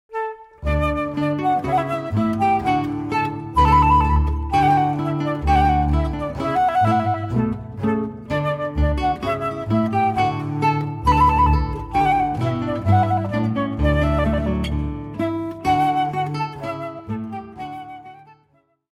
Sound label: Ungarischer March, guitar flute bass trio